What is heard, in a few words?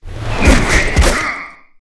Rock Atk